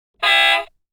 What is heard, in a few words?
Cartoon Toy Honk Electronic